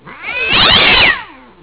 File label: scream